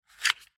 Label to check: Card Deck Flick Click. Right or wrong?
Right